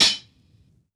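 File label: Vinyl Closed Hat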